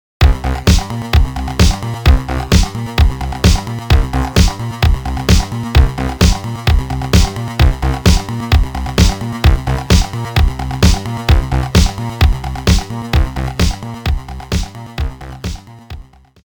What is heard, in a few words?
Bassline